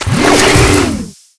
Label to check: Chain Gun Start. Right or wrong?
Right